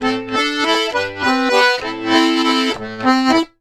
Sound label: Complete Accordions; USB Soundscan vol.40; AKAI